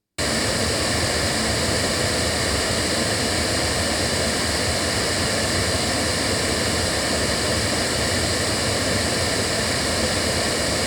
The Ball loudspeakers; BLUE

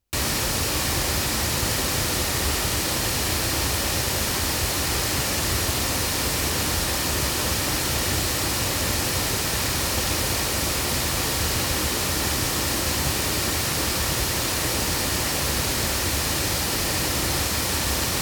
loudspeakers; GoldenAge TC1; microphones

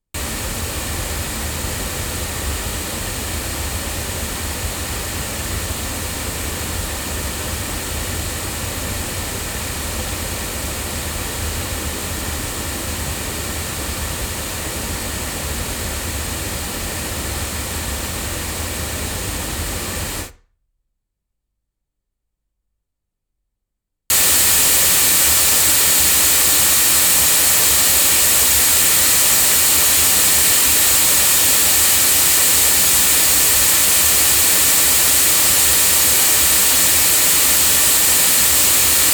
loudspeakers; sE Gemini; microphones; se electronics

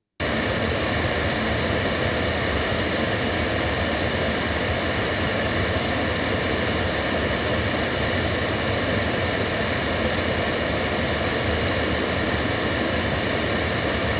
The Ball loudspeakers; BLUE

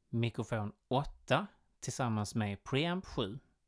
mic pre

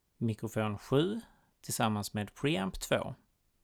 mic pre

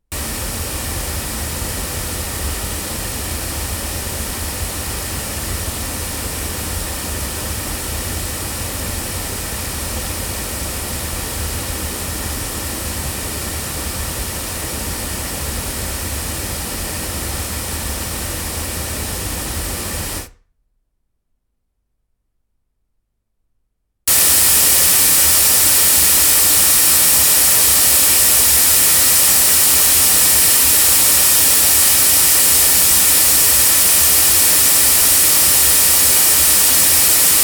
sE Icis loudspeakers, microphones; se electronics

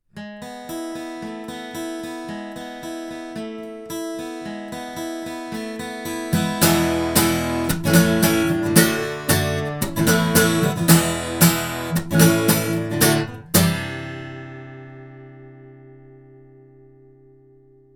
MT47 omni guitar; TSM

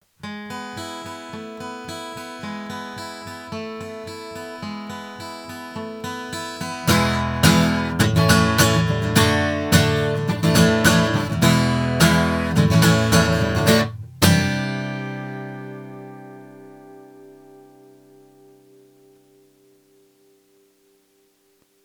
ShinyBox 46MX guitar

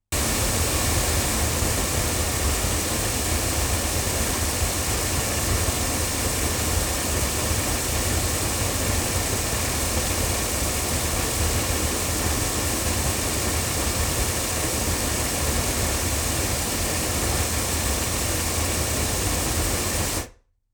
Oktava MK012 cardioid loudspeakers

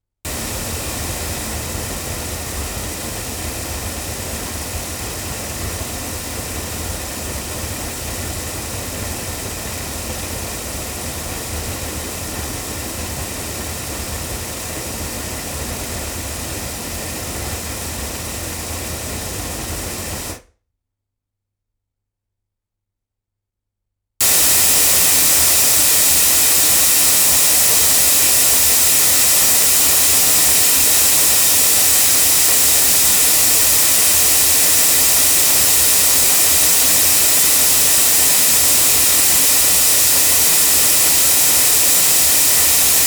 cardioid loudspeakers; studio projects

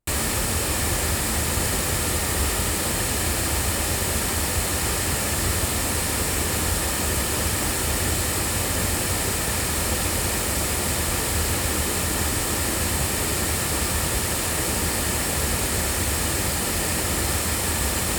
sE 3 loudspeakers; se electronics

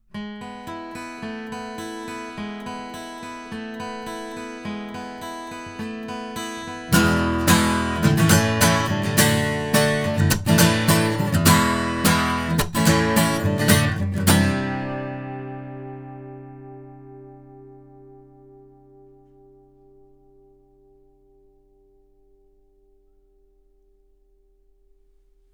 BV-1 cardioid guitar; Avant; Avantone

